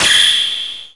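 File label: percussion FX, Dance Drums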